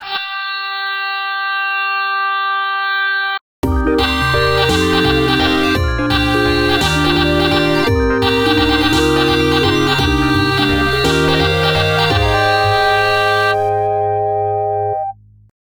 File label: guitar; ニコニコ動画